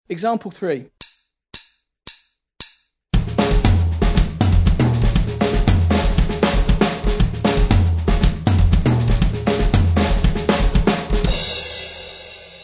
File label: drum set; Independence day 20